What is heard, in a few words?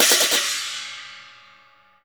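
CHINA LG3 L, China cymbal; Spectrasonics; Heart of Asia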